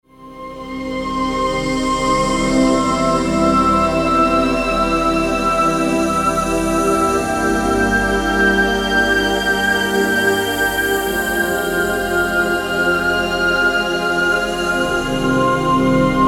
pad

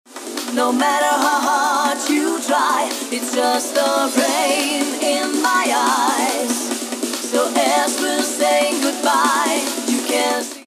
Vocal Only

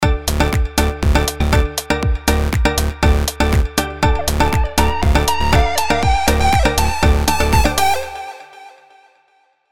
Tropical Dance; Lethal Demo 4

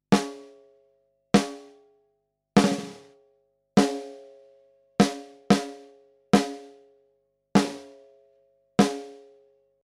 goldmike mk2 sm 57 snare air high

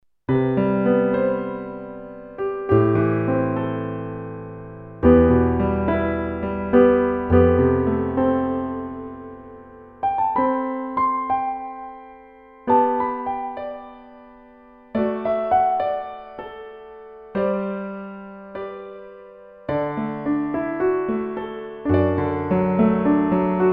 Roland Go Piano 88 Piano

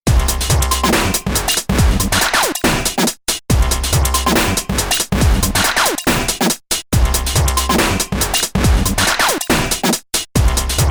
Zoom AR 48 Dubstep

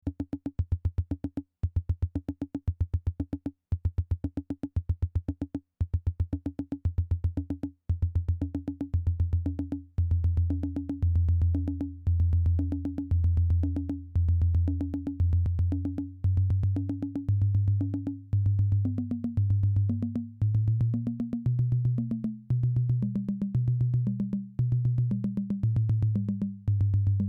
TomTom Volca Beats

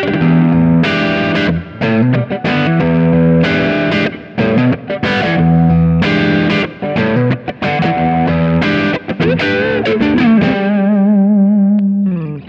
Ibanez AZR420 Humbucker Neck to Bridge Crunch

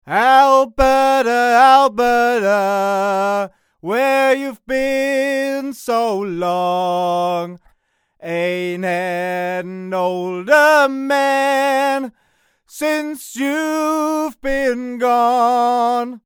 Blue BabyBottle S1 far

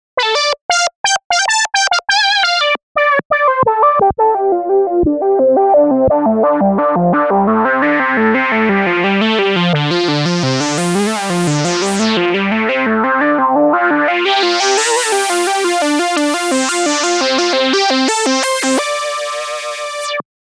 Solo; GForce MiniMonsta2

